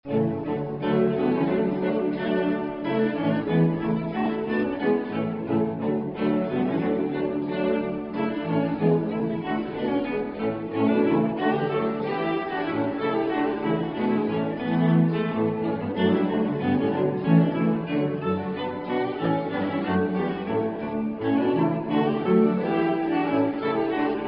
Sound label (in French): violoncelle funck; Le violoncelle est issu de la viole de gambe; Gavotte de la suite pour 4 violoncelles de David FUNCK